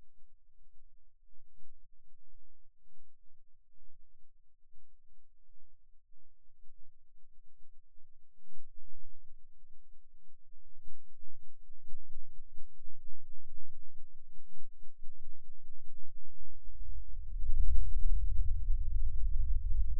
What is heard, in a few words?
Rhythm Seizure, eeg